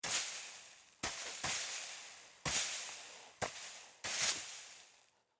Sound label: Sand Dig Movement; Playgrounds; Backgrounds; Foley; Blastwave